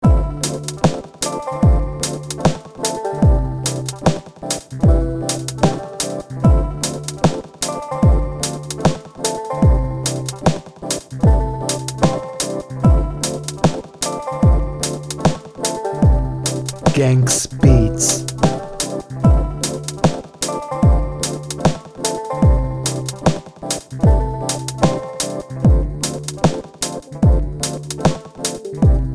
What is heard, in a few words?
warm slow hiphop beat